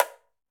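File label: Tamborim Mute, latin percussion; Drums; Drum Hits; Tambourin; Ableton; Factory Packs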